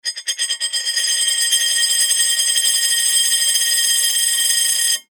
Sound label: coin spinning